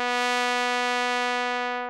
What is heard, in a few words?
tenor trombone B3